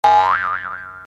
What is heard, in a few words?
Sfx Bounce; nyan cat lost in space